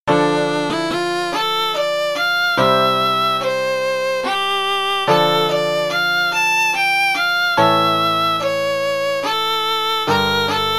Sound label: violin